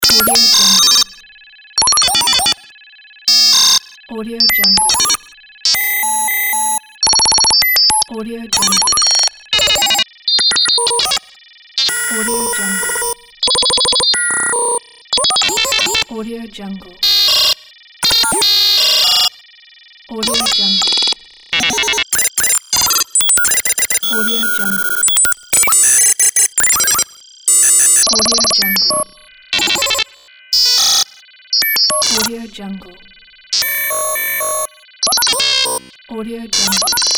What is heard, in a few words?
digital cybernetic futuristic technology pack